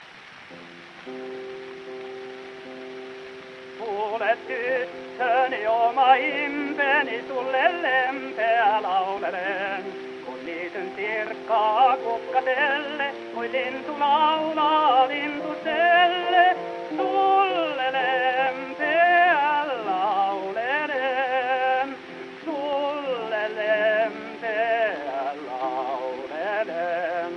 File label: Gramophone